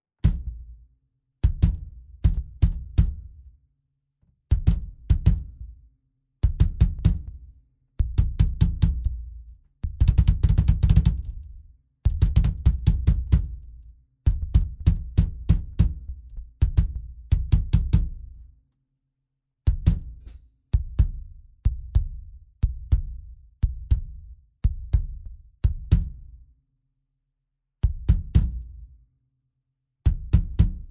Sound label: Fame MS Drumkit EZ Bassdrum; Fame MS Drumkit EZ und Pro